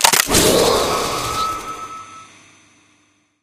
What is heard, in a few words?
powerup pickup vampire; Source Engine; tf